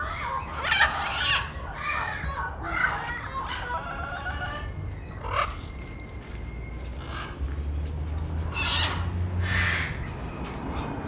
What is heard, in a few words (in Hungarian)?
zöldszárnyú ara szélvédő; állathangok; nyíregyházi zoo 2010